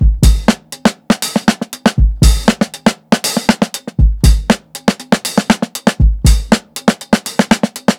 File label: 120 FUNKY, drums; LOOPS; Basement drums vol 2